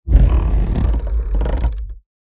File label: Squeaky industrial machine friction noise